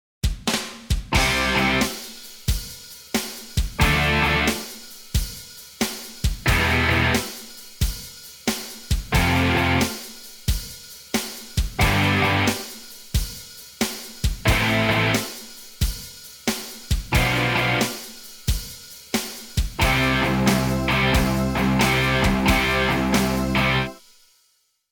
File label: Opening swing